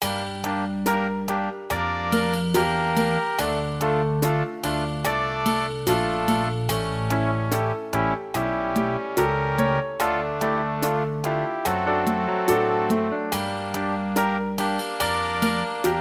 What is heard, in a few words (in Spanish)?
guitarra midi con guia